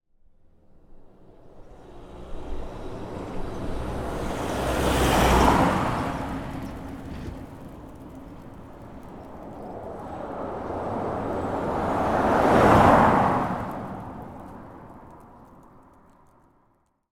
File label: transport small van followed by car passing on country lane